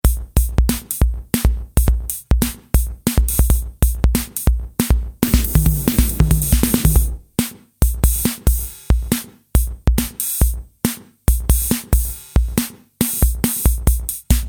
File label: Korg Rhythm55b; synth demos